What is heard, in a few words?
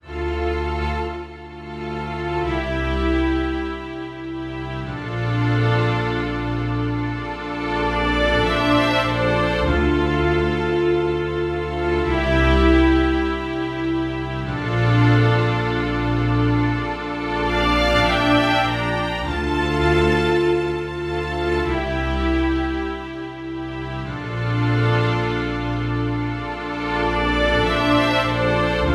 full string mix